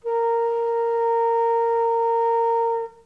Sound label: B4 baroque, flute